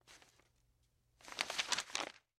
Parchment Paper Rust; paper mvmt

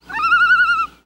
Loon Flight Call

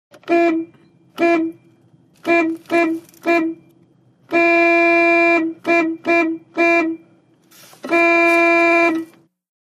1970 Int Volks Horn; 1970's int.

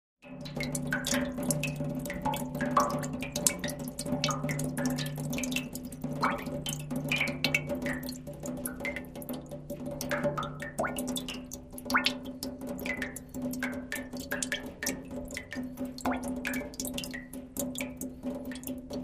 Water Drops A Metal Tan, pouring

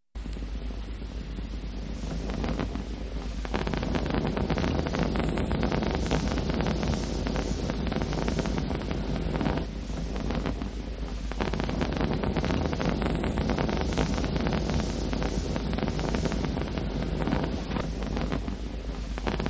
Hand Held Torch Fire; torches

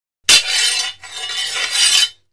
Swords; Broad Swords Hit. Three Times.; clangs

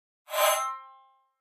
Pop Up HF Elect Scrape, pop up element

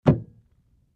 Beer Mug Thump Clinks; mug drinking

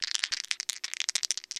Dice Throw Around

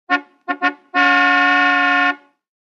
1950 Car Horn 4 Honks

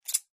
clippers snip; garden shears

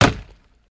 Axe Chop Wood Blade B

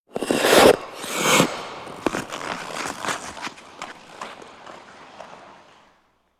Hockey Player Skate

